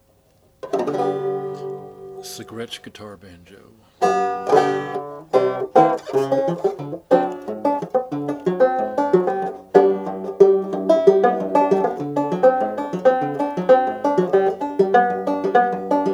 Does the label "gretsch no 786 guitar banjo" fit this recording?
Yes